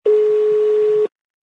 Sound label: call